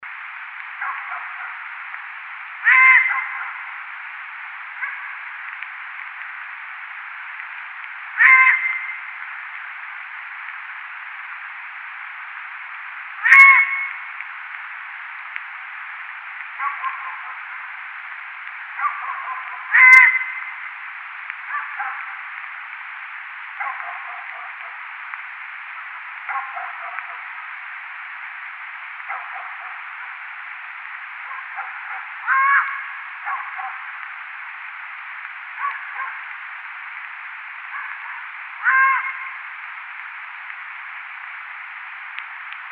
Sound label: Long-eared Owl